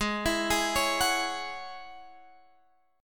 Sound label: Ab 7th Suspended 4th Sharp 5th, chord